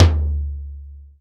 Dry Tom, Drum Machines Roland R-5

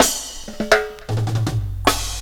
Kettle Roll, Drum Rolls; Jungle; Zero-G Jungle Warfare 1, 2 & 3; 90 s Sample CDs

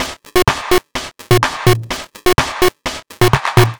126 No Bd, 126bpm; Nu Dance Electro; Zero-G; Soundclash; Combi Loops; Dubnotronic

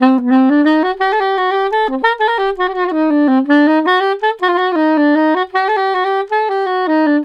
SAX, 132, Balkanish Traditions; USB Soundscan vol.63; AKAI

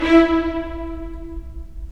STR, Roland; Roland - LA Composer vol. 4; Full Short

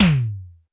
Roland U220 Dry Set

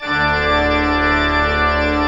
PED, Pipe Organ; E-MU Producer Series Vol. 2 – More Studio Essentials; Keyboards

